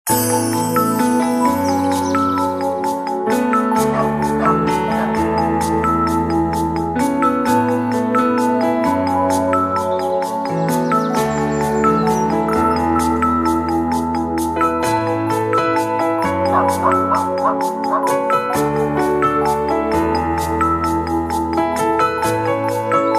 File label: Walk in the woods; LG LX370; ringtone